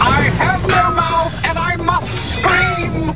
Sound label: Must Scream